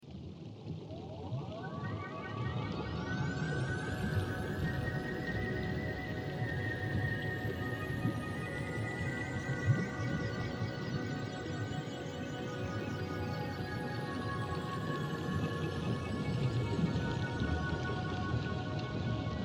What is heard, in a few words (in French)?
ambiance petite agence